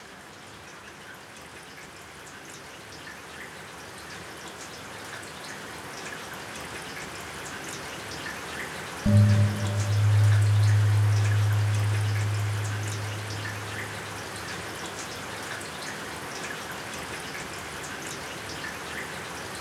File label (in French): Son De pluie Rain Sound